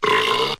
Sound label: burp short